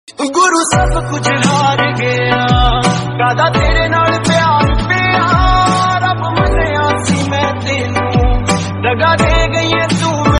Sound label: new punjabi ringtone